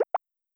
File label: bubble Up; Apple iOS 16